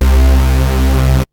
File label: BASS; SoundFactory; SMPMANIA